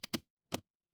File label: feedback achievement